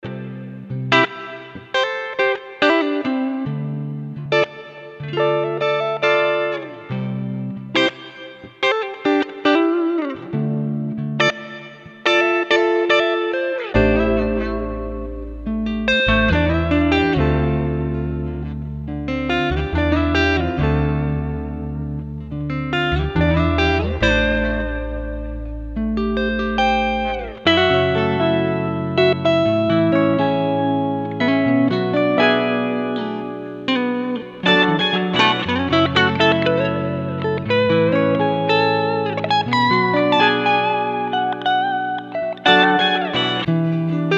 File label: Easy Guitars